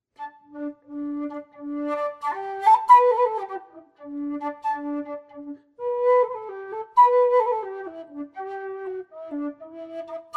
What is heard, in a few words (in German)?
freie Improvisation